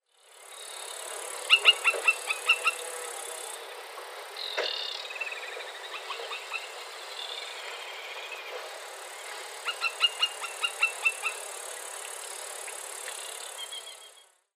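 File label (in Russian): bolotnyy lun golos, marsh harrier voice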